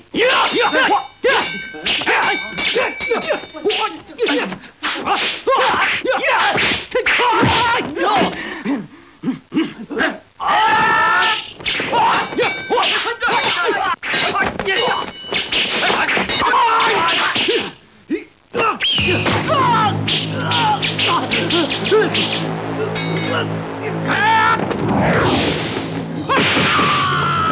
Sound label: Fight; 特酷声音素材库